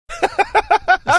laughing

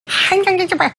making marsupial gurgle like sound; rachel maddow

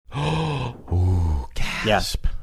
oh hooh gasp

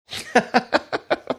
chuckling

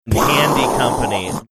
making explosion sound

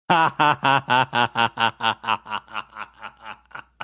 laughing

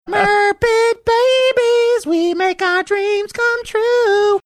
singing babies we make our dreams come true

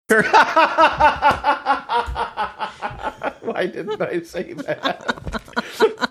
having good laugh

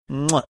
making chefs kiss

